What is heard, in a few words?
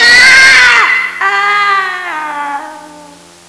thunder